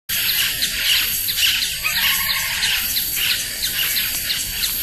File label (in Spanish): Dryocopus lineatus y Scytalopus pachecoi; Urugua-i; Foester; Jorge La Grotteria